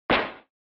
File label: SNES Baseball Mitt